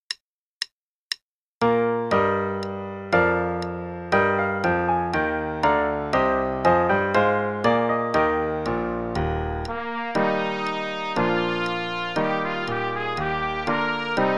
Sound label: Play-a-long, both parts, qn=120; Rigaudon; trumpet